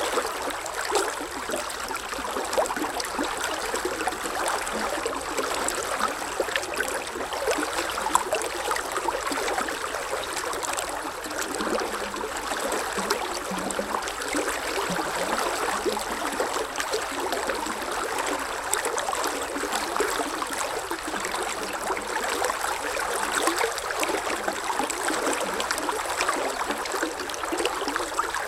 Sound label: river; Golden Crayon Sounds